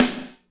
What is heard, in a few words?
snare, R&B Snares, Roland